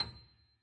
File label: key